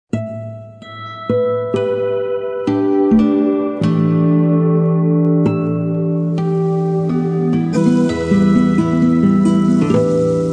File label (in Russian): OTCHE NASH molitva muzh. gitara